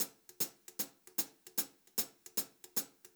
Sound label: Brushed Drum Loops; USB Soundscan vol.39; AKAI; 90 s Sample CDs